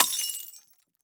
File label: Crash Glass Beer Bottle Breaking On Concrete; crashes; AdobeCC